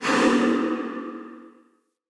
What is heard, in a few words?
Ghost Soldier Pain, Vocals; Enemy; Wandering Ghost Soldier